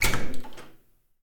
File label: opening bathroom door